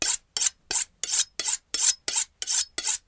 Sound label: Knife Sharpen Fast